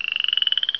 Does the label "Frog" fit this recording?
Yes